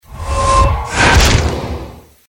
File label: attack; hero